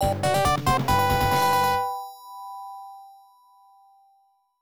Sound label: Unused Jingle; Neo Mr. Do; arcade